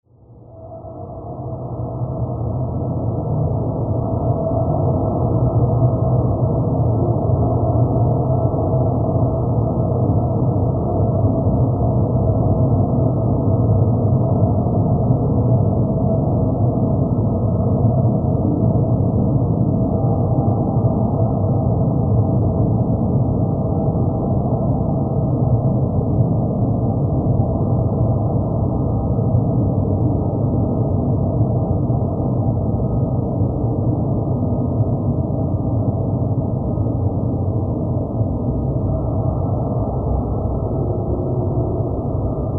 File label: Drones Deep